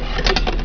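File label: SLOT CLICK, MACHINES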